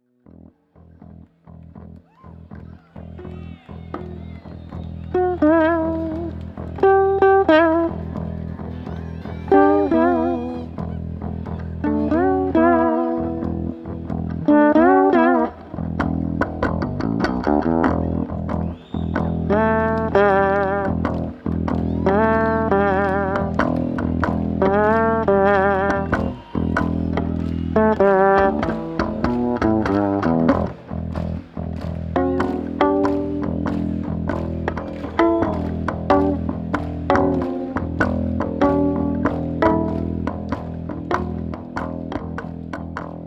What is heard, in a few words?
Bass Improvisation